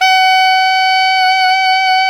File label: SAX ALTO MP, Alto Tube; Roland LCDP07 Super Sax